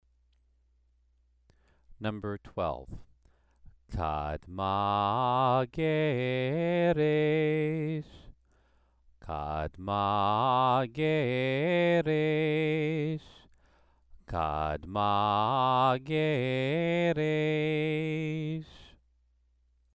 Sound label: Torah trope exercise